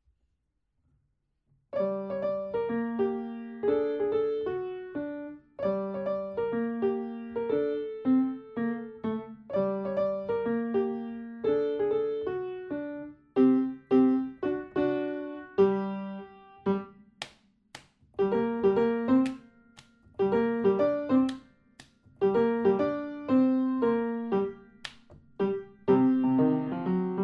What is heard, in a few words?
Cool that jazz